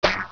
headcrab head bite

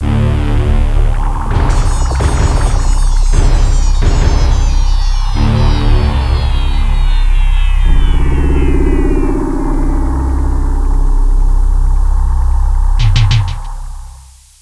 tension

